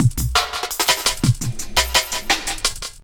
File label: stereo delay; Max